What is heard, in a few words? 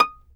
fiddle pizz D#6 R; Sampleheads; Whole Lotta Country 1 & 2